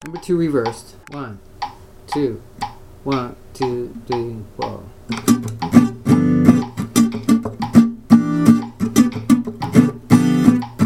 claves original clave comping 12 bar blues reversed